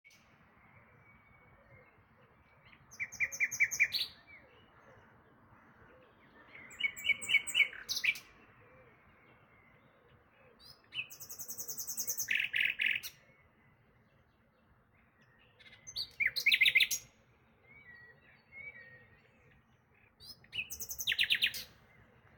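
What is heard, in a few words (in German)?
Dawn Chorus, Vogelgesänge vor Sonnenaufgang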